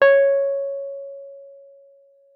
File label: WUF C#5, WURLITZER; Xsample VOL-1